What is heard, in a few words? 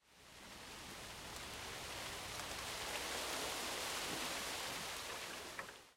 leaves